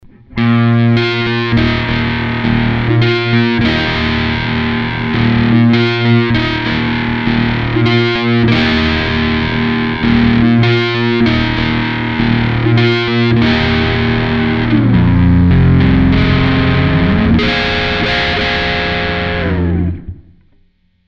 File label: british clean boost